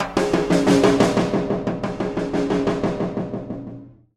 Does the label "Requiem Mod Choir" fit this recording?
No